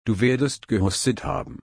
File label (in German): husten